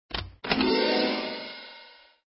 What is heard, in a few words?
task complete